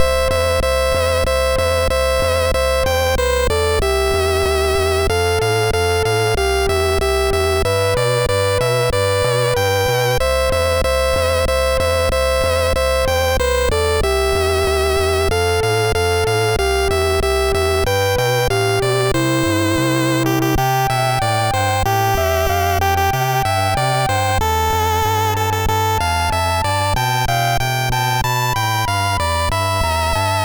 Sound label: Hells Jingle, MOS6581R3